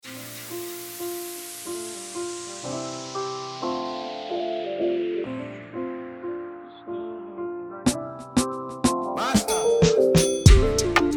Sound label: Instrumental